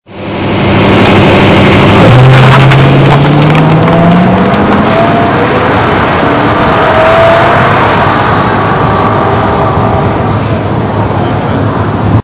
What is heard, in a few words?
V8 run; Leon